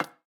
Move Ceramic; City

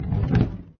Minigame Ball launch; papas cupcakeria online windows gamerip 2013

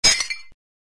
SFX Glass Breaking; From Shadows

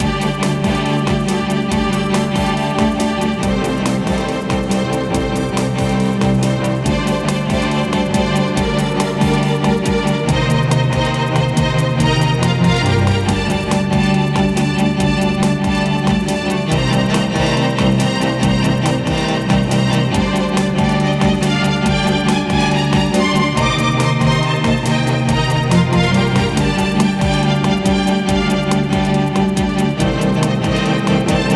level; yager windows xbox gamerip 2003

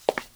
Tile Foot Step

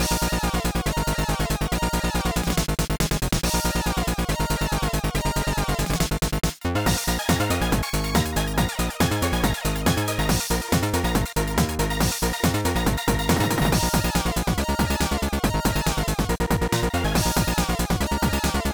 BGM Cheerlead

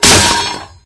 Vehicles Generic

